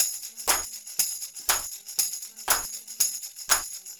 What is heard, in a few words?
tam cp; Percussion